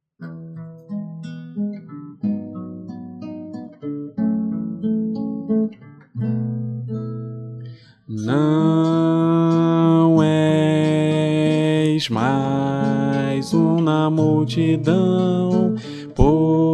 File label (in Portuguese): sopranos e contraltos; para o jovem espirita; Espiritas